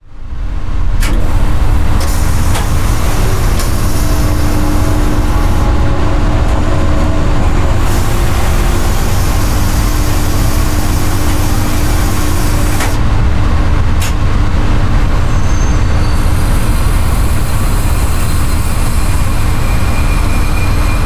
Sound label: sub; echotopia; soundfellas creative audio studios